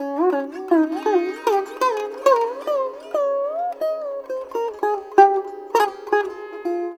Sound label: SITAR; Spectrasonics; Heart of Asia